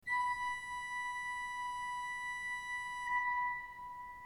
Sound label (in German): ton, orgel